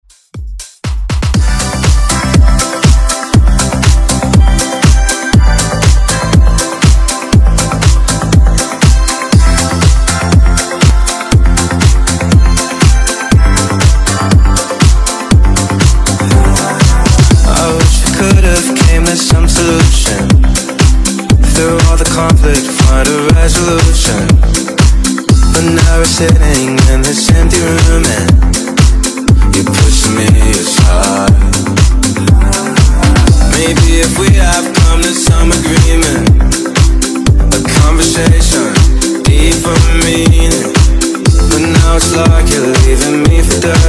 House Redrum

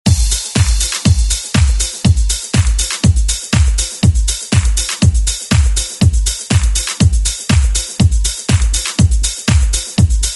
90s ReDrum; DJ Mhark

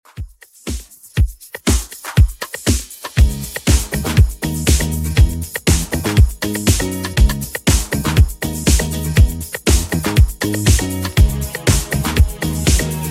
90s Redrum 120bpm

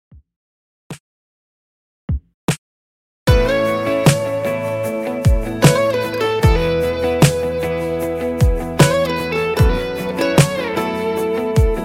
Country Dj Intro Outro 76 Bpm

